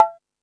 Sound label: Beat Box; E-MU Pro-Cussion